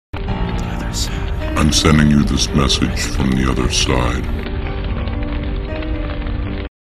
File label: Evil Creepy Msg